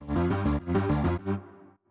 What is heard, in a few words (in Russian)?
сбивка; audiobrending dlya podcasta